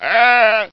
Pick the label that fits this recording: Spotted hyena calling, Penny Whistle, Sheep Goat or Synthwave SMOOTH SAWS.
Sheep Goat